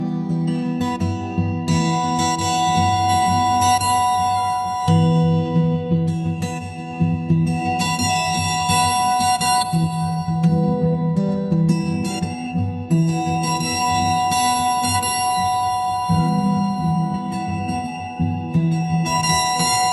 ringtone romantic

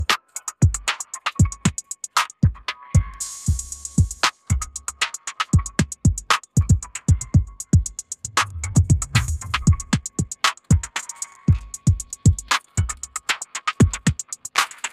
ringtone hiphop

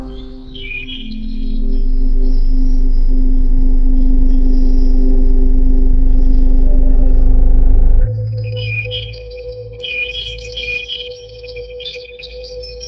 ambient nature

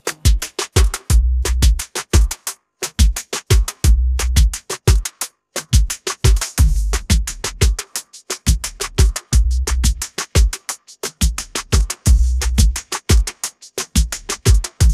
ringtone reggaeton

